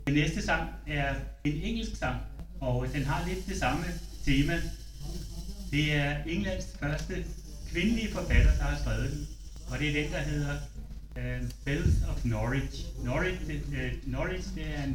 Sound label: Trio